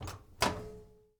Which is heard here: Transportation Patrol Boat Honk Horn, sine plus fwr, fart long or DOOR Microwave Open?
DOOR Microwave Open